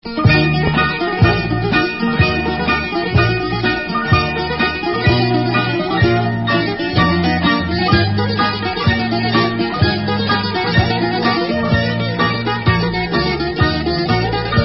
Hasapico

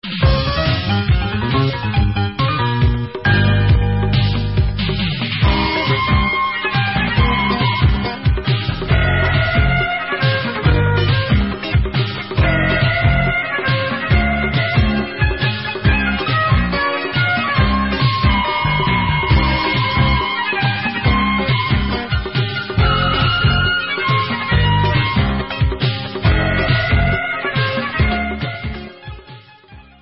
Debka Instrumental

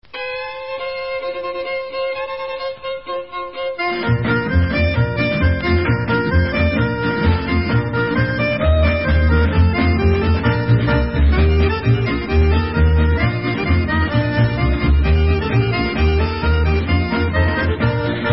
American Instrumental

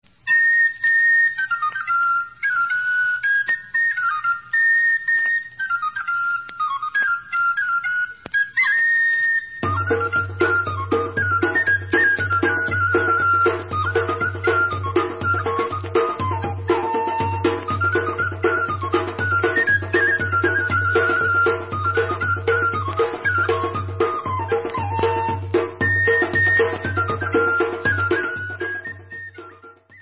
Debka Instrumental

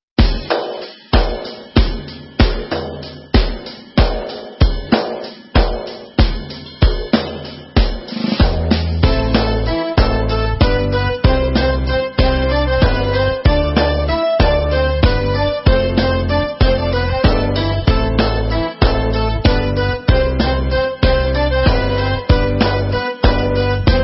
Balkan Instrumental

